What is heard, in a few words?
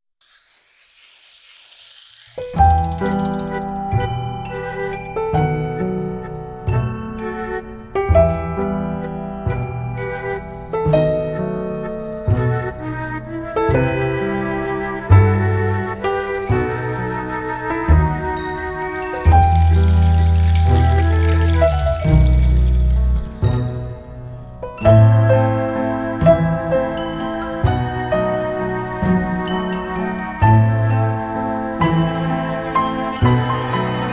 Music Cues